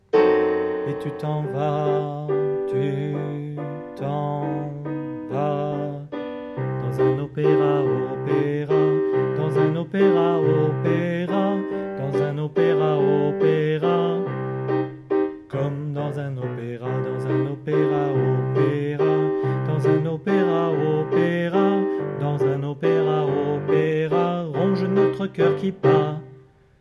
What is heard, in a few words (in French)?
Voix 1